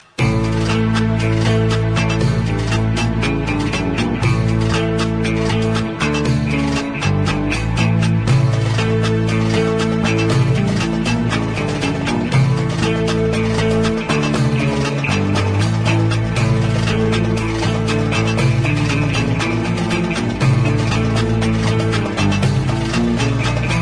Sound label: Guitar